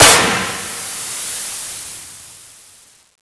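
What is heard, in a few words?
smoke grenade explode; czero opforce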